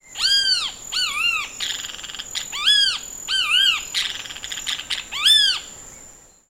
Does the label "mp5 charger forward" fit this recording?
No